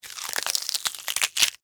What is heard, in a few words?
Crunch Celery Slow; Texture